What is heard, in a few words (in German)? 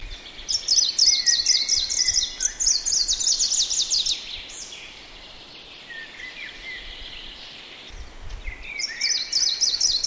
gruenlaubsaenger